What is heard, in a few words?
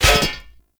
glyph puzzle disc land; Warframe; Duviri